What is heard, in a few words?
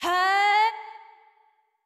Charge Wind Up; Jackie; Going Under